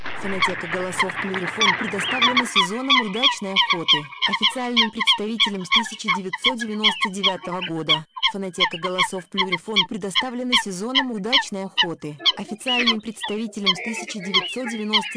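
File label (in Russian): Лысуха, манок; plurifon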